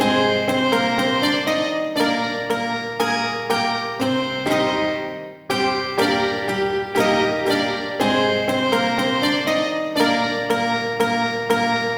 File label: Shawzin Bard Music Loop; Warframe; Duviri